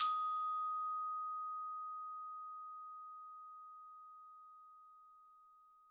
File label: Hard plastic f D#4, keys; fleet keys 2 Samples